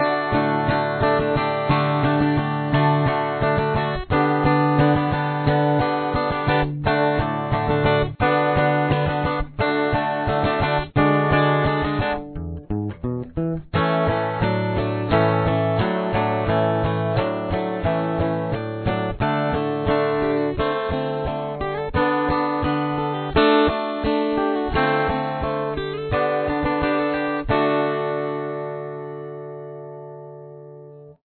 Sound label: chords outro